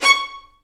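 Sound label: Violin Spiccato C#5; Multisamples; Digital Sound Factory Virtuoso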